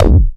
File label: CLICK SNA, HIP HOP; Pro-Rec - Synthsonic